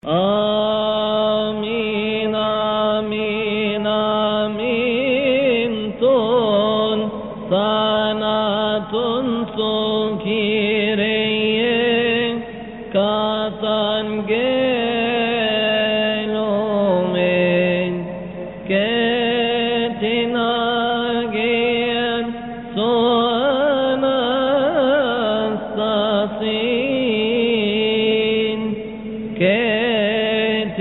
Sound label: Amen Amen Amen Coptic